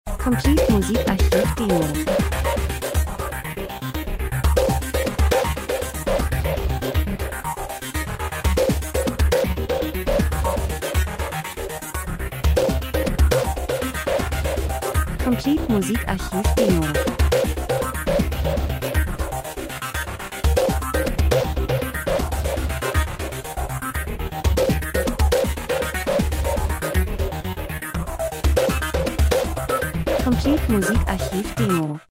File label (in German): drums, rhythmus; Hektik Chaos Rhythmus verfremdet unangenehm Urban Gleichförmig Andersartig Besonders Offroad Reisefilm Lifestyle